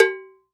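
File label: Cowbell Bongo; Roland SPD-S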